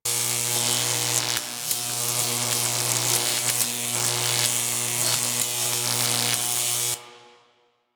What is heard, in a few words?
Shocker Hazard Loop; Spec Ops